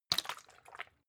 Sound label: bottle water upend light; berufe